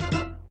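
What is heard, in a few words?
fanfare end of ball, pinball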